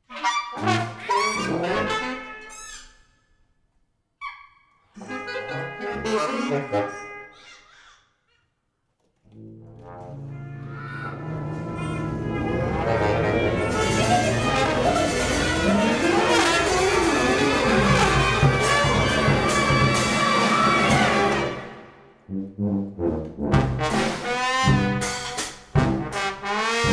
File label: java